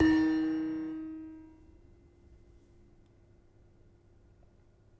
Demung soft, gamelan; gravityharps; timbrebox

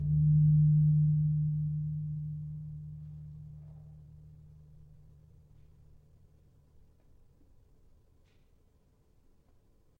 Gong 3 E, gravity harps tuned

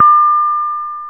reyong hi, gamelan; gravityharps

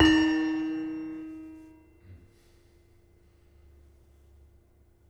Demung hard, gamelan; gravityharps; timbrebox

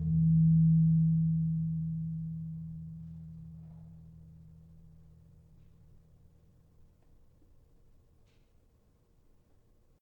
Gong 3 F, gamelan tuned

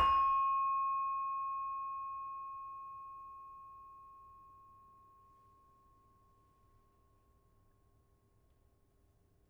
Saron hard, gamelan; gravityharps; timbrebox

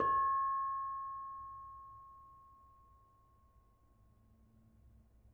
Bonang Barung soft, gamelan; gravityharps; timbrebox

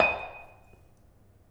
Bonang Panerus, gamelan; gravityharps; timbrebox

